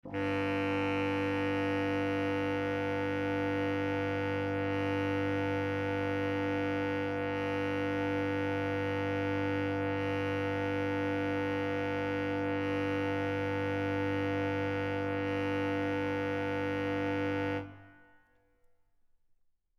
bass note (G)

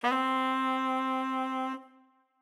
saxophone note C

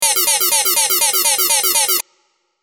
alarm

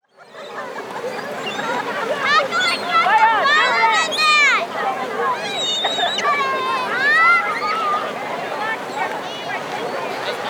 Holiday Calypso, families on the beach; Calypso is a style of Caribbean music that originated in Trinidad and Tobago

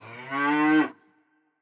cow

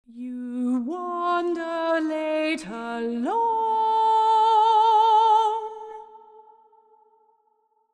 Hush, Lullay, vocal phrase

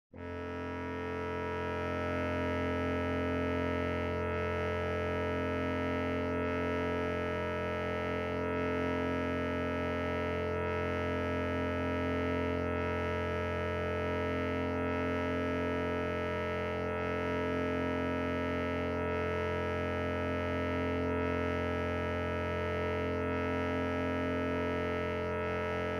bass note (F)

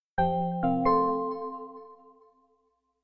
Computer start-up sound, Windows 8; (2012); Motif-makers

